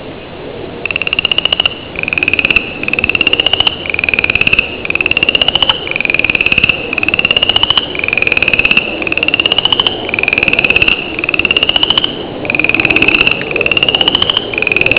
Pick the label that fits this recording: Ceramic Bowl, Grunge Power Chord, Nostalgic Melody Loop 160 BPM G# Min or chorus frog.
chorus frog